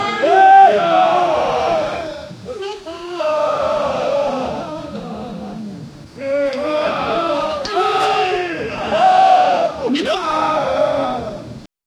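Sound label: group of tamil thugs grunting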